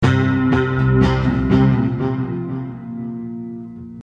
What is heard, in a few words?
BassAmb C 120